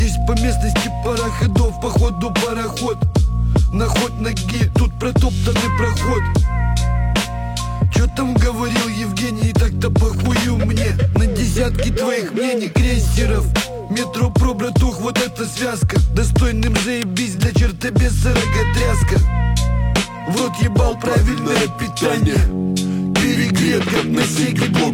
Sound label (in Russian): kriminalnyj bit